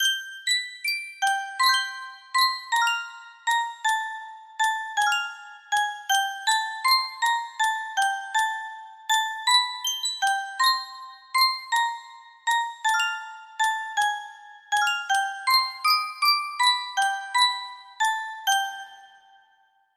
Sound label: danse macabre elegant and creepy, music box